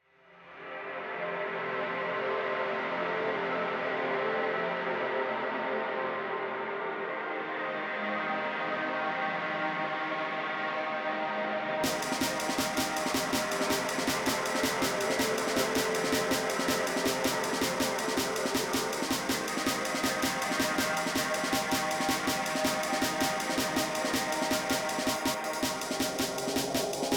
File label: Jungle Amen Break